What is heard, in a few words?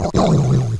pit drone idle; svencoop